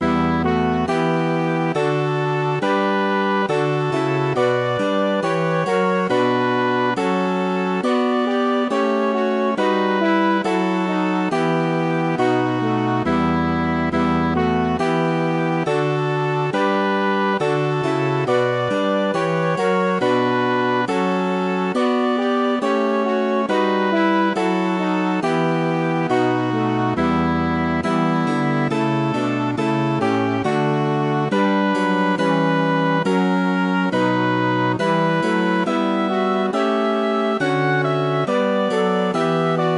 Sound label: MIDI